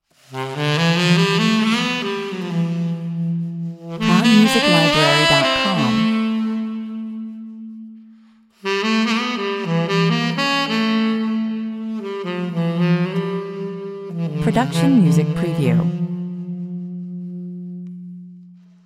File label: sax solo